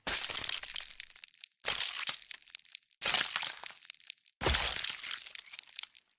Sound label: stepping on eggs; Dark Souls III